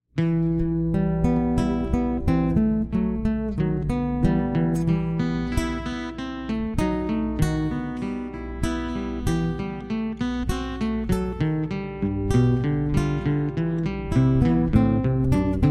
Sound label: andante; conservatoriobauruense2022